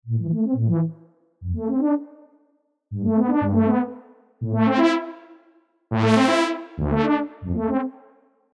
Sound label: filter automation